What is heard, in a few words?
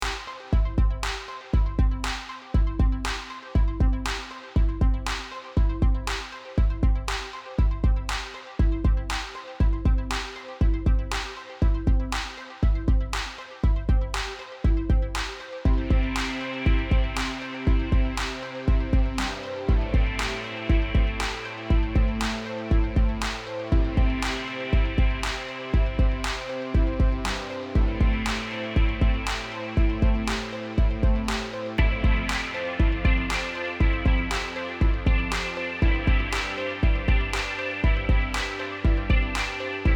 synth